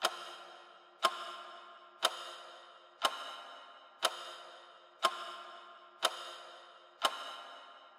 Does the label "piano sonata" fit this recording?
No